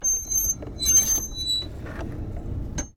slidingdoor open

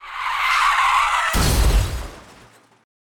car accident

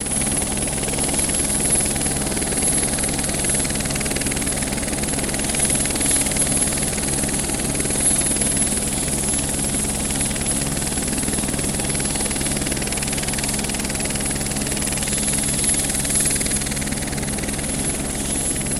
helicopter flight

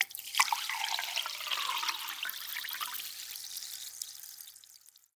glass carbonated drink

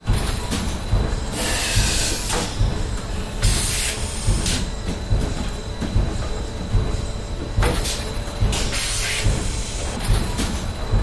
machine room

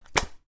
book close

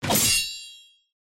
sword clash

